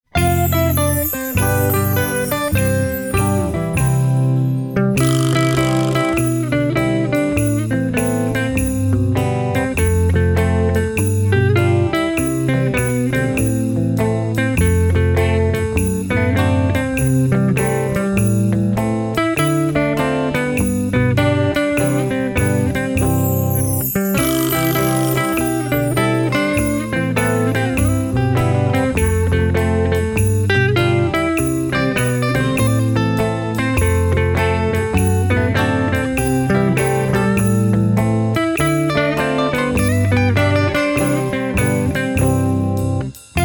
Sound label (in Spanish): Himno instrumental; Copihuito